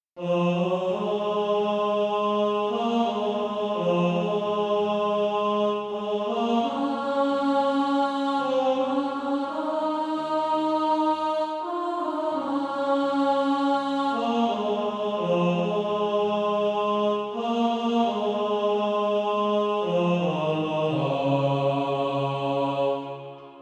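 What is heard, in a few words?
tenor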